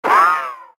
jump; Rob Runner

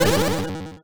teleport; VirtualBoyEscape

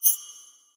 coins; DeadlyRace

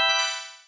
jewel; Metal Bot

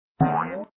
enemy get hit; Santa Run

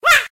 duck shooted; DuckShooter

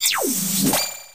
fireball; BrickOut

